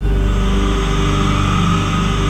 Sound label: NITROUS; NorthStar - The Wizard VOL-2; THE VOID